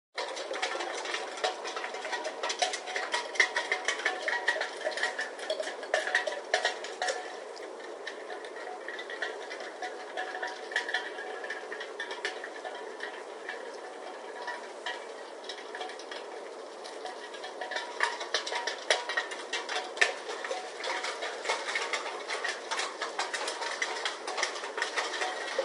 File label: debris in the wind; Pitch Black - A Detective's Demise